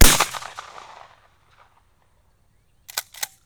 SHOT

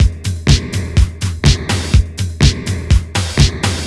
LOOP 124

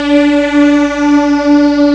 d50 string; Amiga Soundtracker Sample Packs

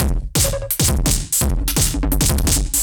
Beat Digi 170, distorted drum loops 170bpm; musicradar

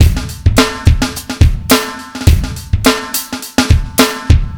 Funky 105, Drums Beats

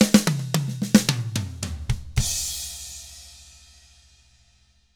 POP ROCK, Double Platinum Drums; 109POP ROCK

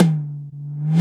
TOM, DRUMS; Analogon

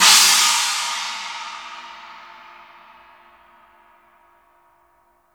PANG, cymbals; Sampleheads - New York City Drumworks VOL-1; SW CYMBALS

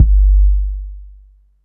BOOM, BASSDRUM, Techno 138 BPM; Best Service ProSamples vol.54; AKAI

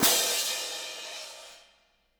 Cym short f, Cymbals; 90 s Sample CDs; PowerFX - Downtown Orchestra

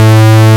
PWM; Techno Trance; E-MU Formula 4000 Series Vol. 2; Orbit Presets

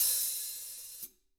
HTRA OCLS, Drums, TAMA; ILIO; Double Platinum Drums 1; TAMA+TAMA

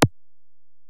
EPerc, Alesis DM-PRO; 300 Drum Machines

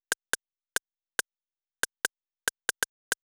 drum Loop 140BPM Perc

